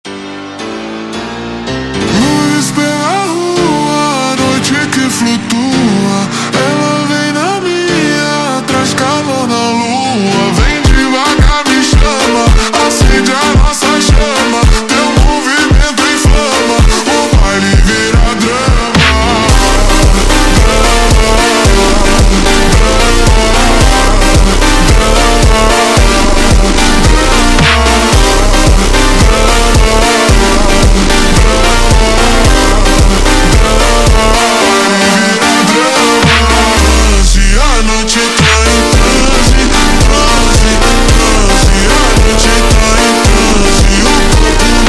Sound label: Super Slowed, funk